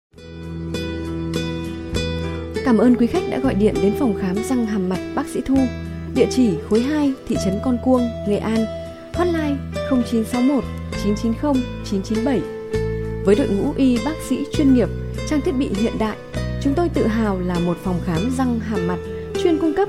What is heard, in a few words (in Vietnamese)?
Nhac cho doanh nghiep Nha Khoa